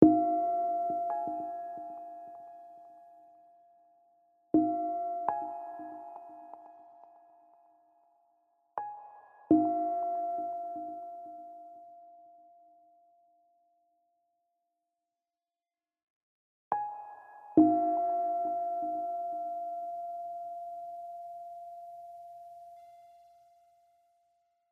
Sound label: Village Bell; The Unfinished Swan